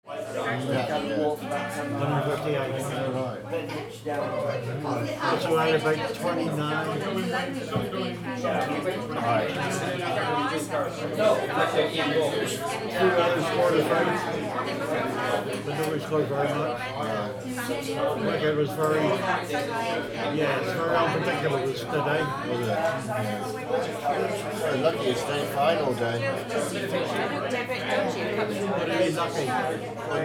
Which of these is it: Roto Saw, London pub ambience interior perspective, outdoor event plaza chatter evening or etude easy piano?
London pub ambience interior perspective